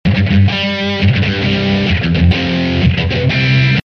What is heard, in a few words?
G3 129 rhythm